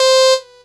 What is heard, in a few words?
DD SYN, Disco House; Best Service Dance Mega Disco House